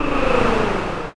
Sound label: zombie